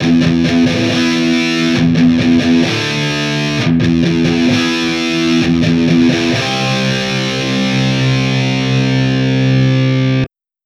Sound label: triple E, amp samples